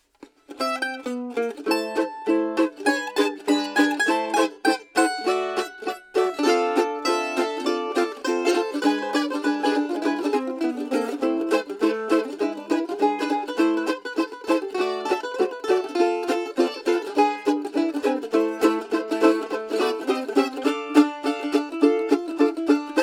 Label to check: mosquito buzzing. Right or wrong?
Wrong